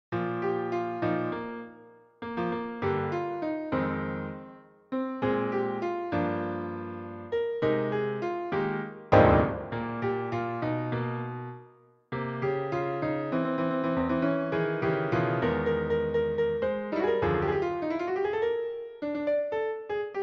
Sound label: jingle